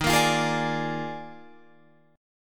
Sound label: D sharp Major 7th Suspended 2nd Suspended 4th, down, Guitar, Drop D